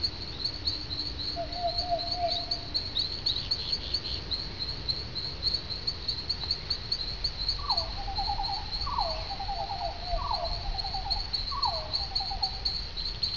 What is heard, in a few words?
FOREST, jungle